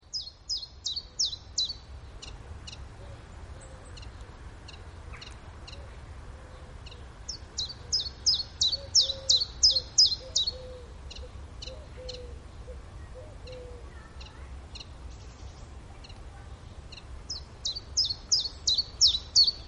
Tjiftjaf Phylloscopus collybita Geluid; Annelies van Klinken

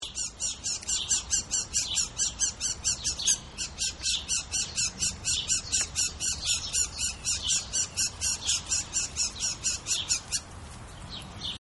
Zwartkop Sylvia atricapilla Geluid; Annelies van Klinken

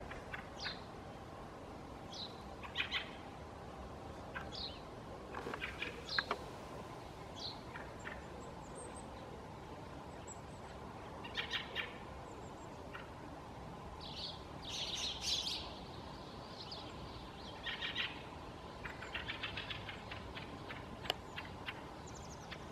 Kramsvogel – Turdus pilaris Vink Fringilla coelebs Geluid; Annelies van Klinken

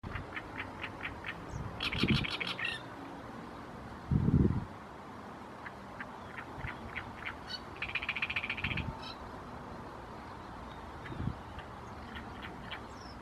Grote Karekiet Acrocephalus arundinaceu Geluid; Annelies van Klinken

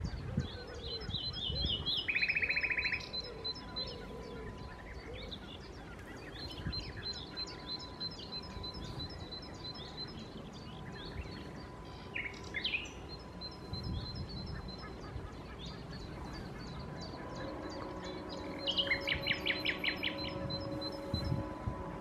Nachtegaal Luscinia megarhynchos

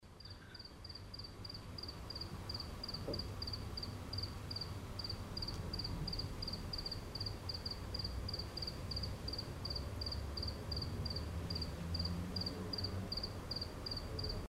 Huiskrekel Acheta domesticus Geluid; Annelies van Klinken

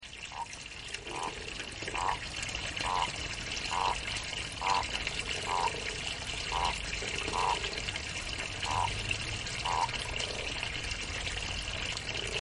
Gewone pad Bufo bufo Geluid; Annelies van Klinken

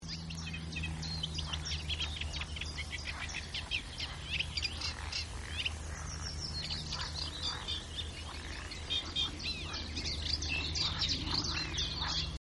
Kleine Karekiet Acrocephalus scirpaceus Geluid; Annelies van Klinken

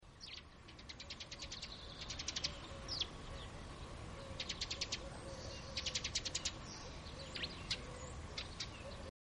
Ringmus Passer montanus Geluid; Annelies van Klinken

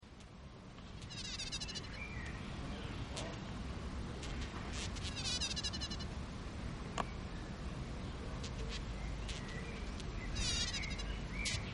Grote narcisvlieg Merodon equestris Geluid; Annelies van Klinken

